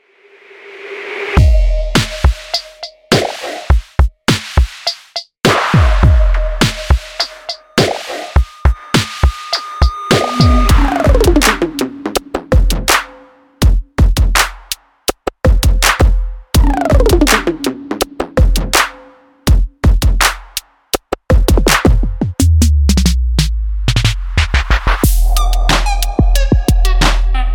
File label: drum